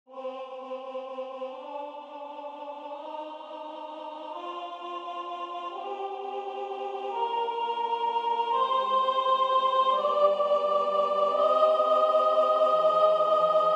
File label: Alleluja soprane